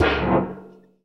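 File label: BIG TIN, percussion metallic; Rarefaction; Poke in The Ear With A Sharp Stick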